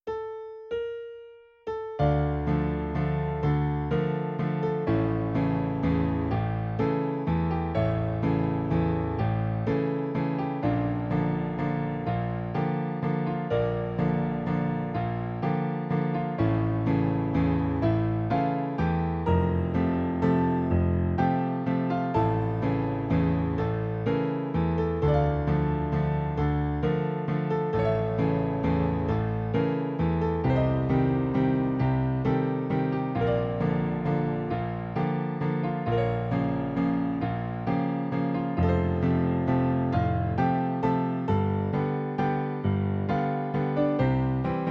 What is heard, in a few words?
Waltz